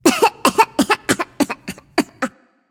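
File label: nonverbal clear throat; smite; voicepack; izanami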